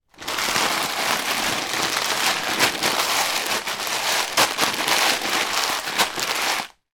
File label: food bag of frozen vegetables movement in hand